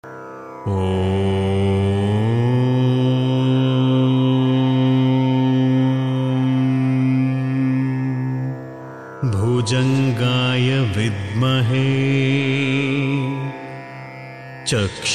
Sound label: sarpa gayatri